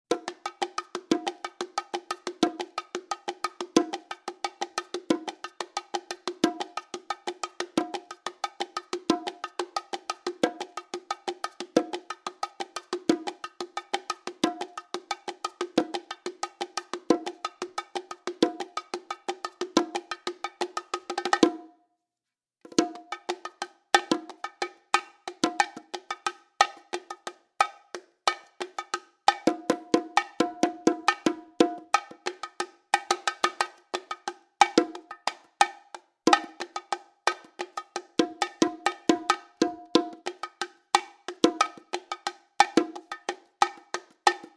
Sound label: bongos samples bpm 180; robert vilera